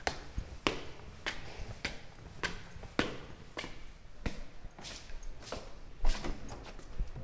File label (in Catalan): Pujar Escales